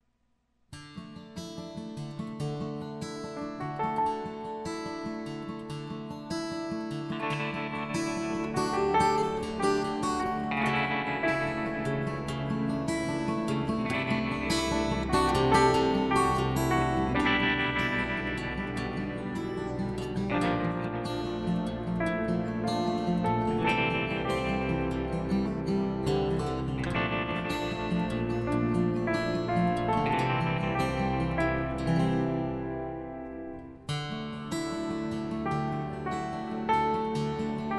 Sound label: pad guitar